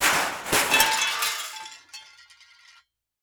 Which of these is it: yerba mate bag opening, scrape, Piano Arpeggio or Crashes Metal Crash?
Crashes Metal Crash